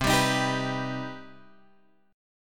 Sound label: C Minor Major 7th, Guitar, Drop D; down; chord shape